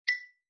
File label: Click; conservation catapult; project green